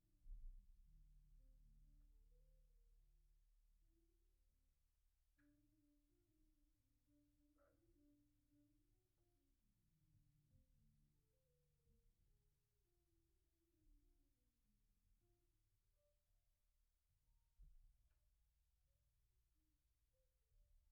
Bass DI

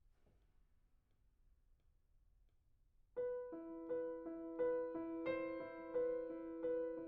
PIANO

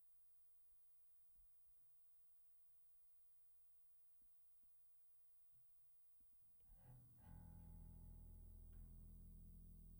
E Bass

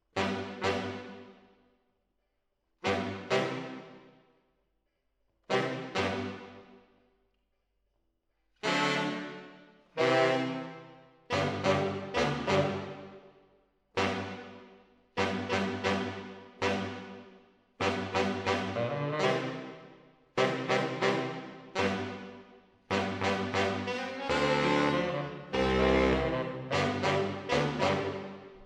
MKH AB, piano

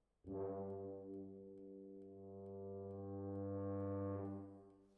Horns Over

